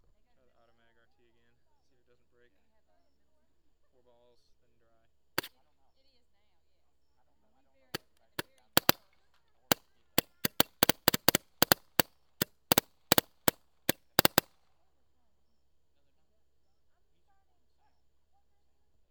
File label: automag, guns; automag rt